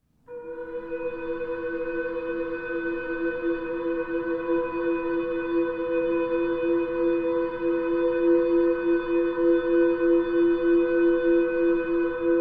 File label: motorola default ringtone